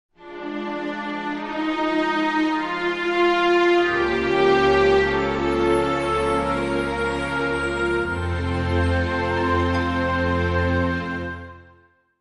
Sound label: ambience romance; Knights of the Old Republic II-The Sith Lords